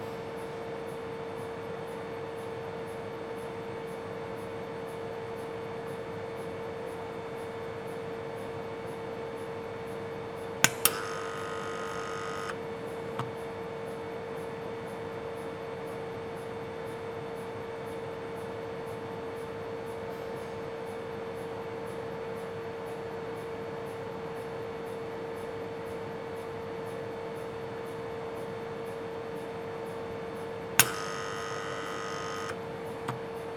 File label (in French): Cuve Mercure valve pompe en acoustique